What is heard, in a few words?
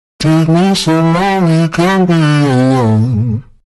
backvocal in flstudio